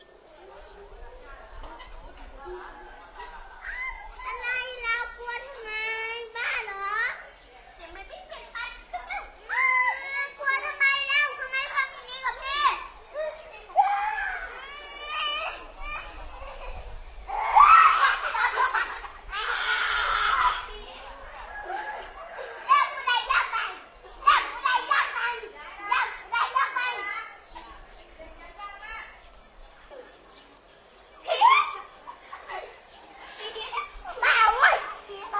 KIDS SQUABBLING

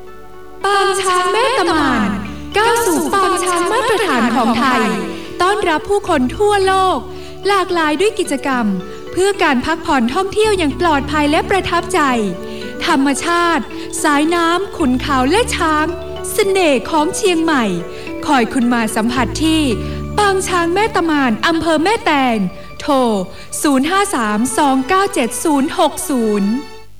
radio elephant camp

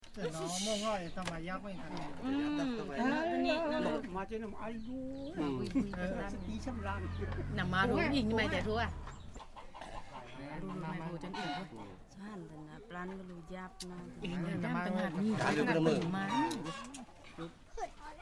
Pa O foursome

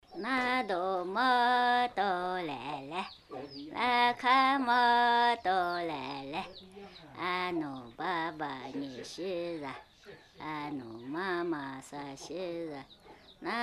lullaby

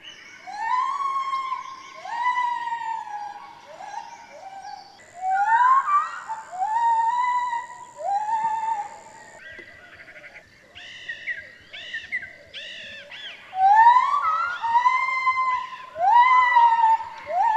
gibbons cleaned

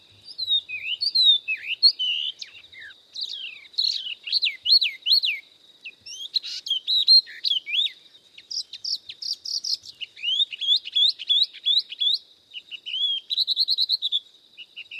Spot throated babbler

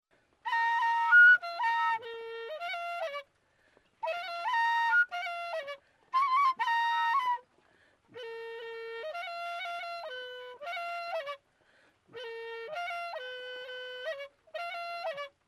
tunku membrane flute, tribal

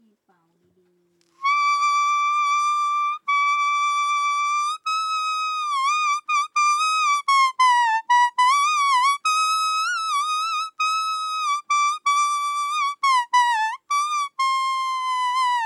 leaf

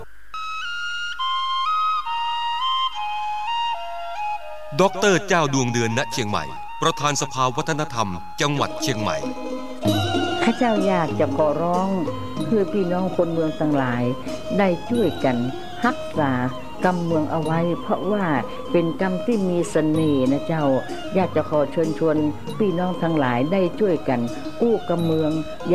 radio; kham meuang

